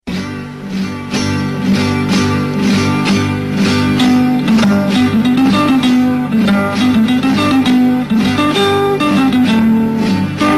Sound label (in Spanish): generala guitarra; rne